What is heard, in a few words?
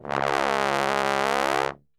C#1 BASS TRB, Brass; Best Service; Brass Super Section